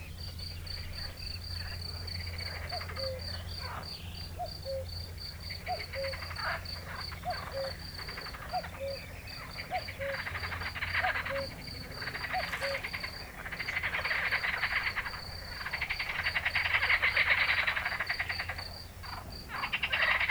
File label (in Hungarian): kecskebéka kakukk mezei tücsök; termeszetben